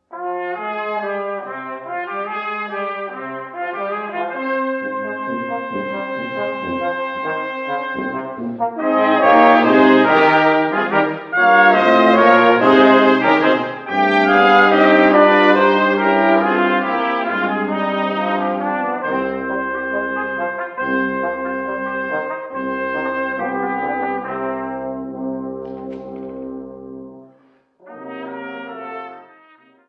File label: Brass Quintet